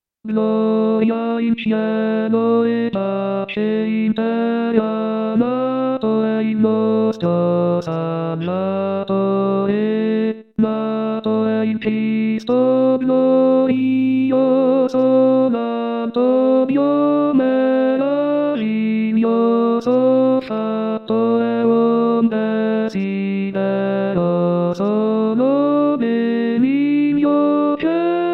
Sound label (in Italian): Tenori; natale